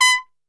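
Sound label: B3 trumpet shot, Brass Super Section; Best Service; TRP SHOT; 90 s Sample CDs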